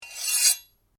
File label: Blade Scrape Medium, Sword; Foley sounds for melee weapons; Swords (Sound Effects:Combat)